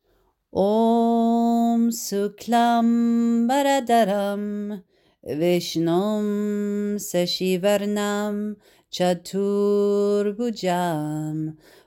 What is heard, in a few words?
GANESH MANTRA sung; 3x